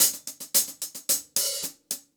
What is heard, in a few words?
Hi-Hat Groove